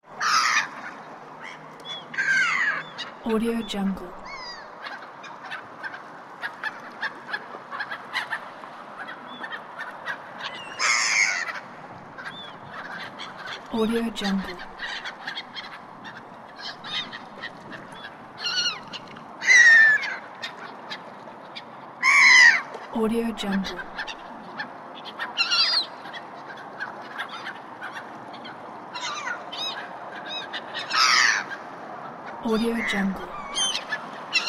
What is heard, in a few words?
seagull sounds